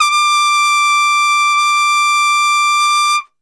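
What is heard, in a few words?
D 4 trumpet straight, brass; Best Service; Brass Super Section; TRP STRGHT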